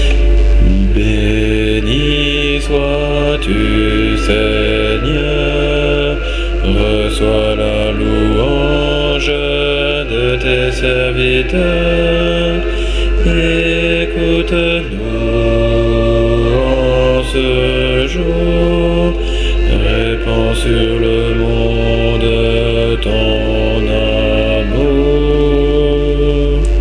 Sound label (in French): basse; MPE Benis sois tu Seigneur; mariage